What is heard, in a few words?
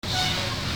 truck air brake